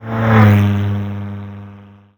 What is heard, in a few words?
Car Passby Fast